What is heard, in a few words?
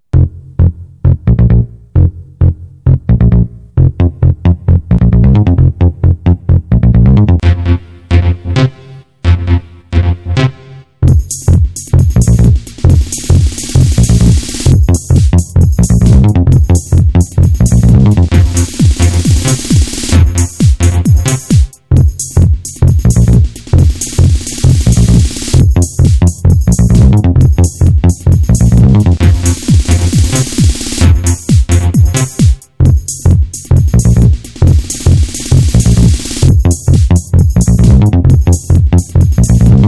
House Bass